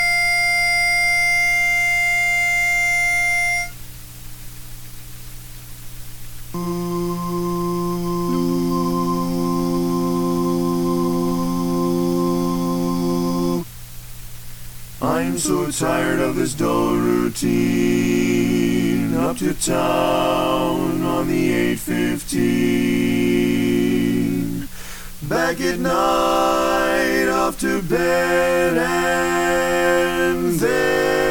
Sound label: baritone missing